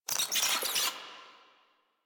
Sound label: Infested Ashen Vocal Pain; Warframe